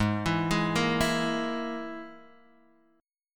G sharp Suspended 2nd Sharp 5th, Guitar Half Step Down